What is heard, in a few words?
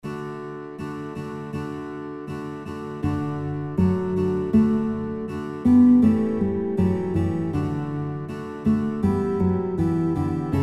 Guitar, kinderlieder gitarre playalongs; Guitar Songbook; A B C Die Katze Lief Im Schnee